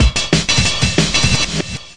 south street crunch, DRUM LOOPS and BREAKS, 184 bpm